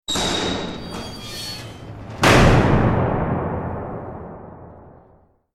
bruit porte; victor pellet; tempete

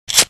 briquet; victor pellet; tempete

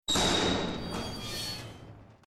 ouverture porte; victor pellet; tempete

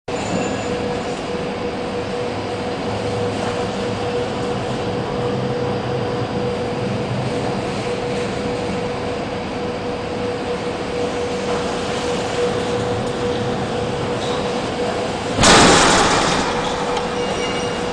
ambiance nuit; victor pellet; tempete

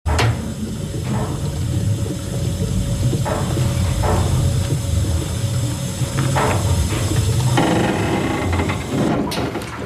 bruit machine; victor pellet; tempete

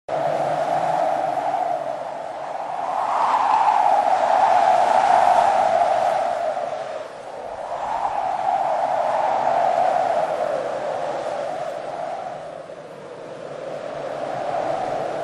vent; victor pellet; tempete

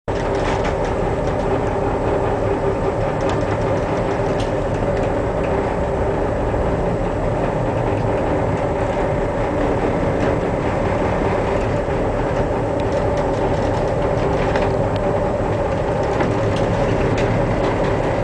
cabine bruit; victor pellet; tempete

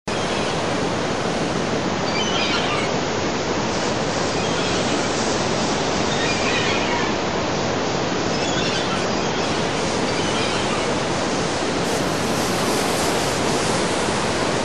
mouette; victor pellet; tempete

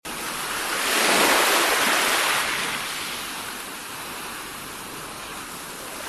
vague plage; victor pellet; tempete

